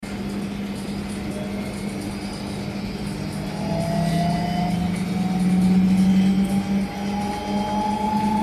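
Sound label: centrifuge sound; LaboratoryProtection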